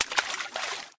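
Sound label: unexpected splash of small dolphin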